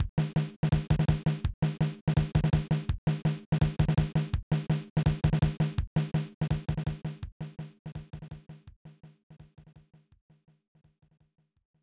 Drumbeat; fun n games 1994 snes

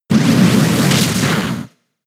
Power Up Punch; pokemon sfx gen 6 attack moves xy oras

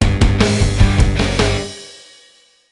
Sting Unlock Move; X-Men Origins Wolverine; NDS

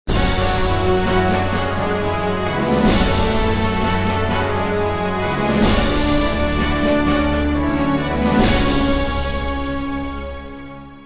Industrial Agitated; Civilization Revolution; Xbox

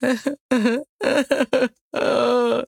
moan; Devour 2021 gamerip pc macos

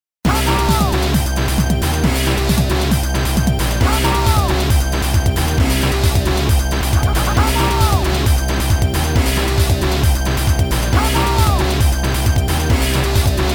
nighttime battle; gigawing; Capcom Lovers Arcade Collection Volume 1

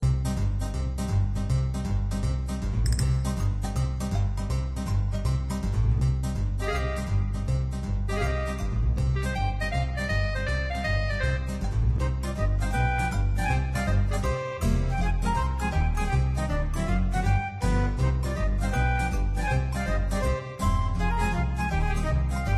music game; club penguin island; android

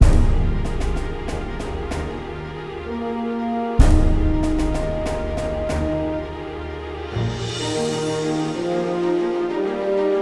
Victory LOOP; Silent Hunter 3

